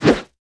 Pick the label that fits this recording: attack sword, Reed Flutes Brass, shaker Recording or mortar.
attack sword